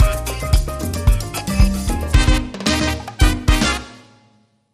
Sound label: The Salsa Sting